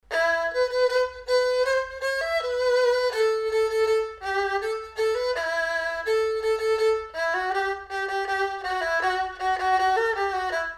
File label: erhu